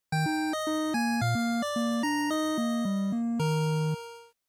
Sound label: NES Action Clear